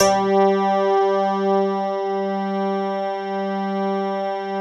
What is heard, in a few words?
ROCKIN BA; Greytsounds; Scott Peers Sound Engineering